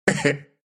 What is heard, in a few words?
laugh ru